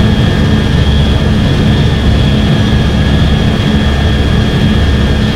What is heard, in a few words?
engine cockpit; War Thunder; engines